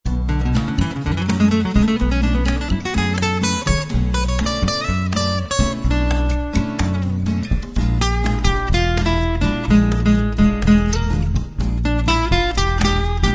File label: bossa